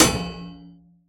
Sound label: ExoHit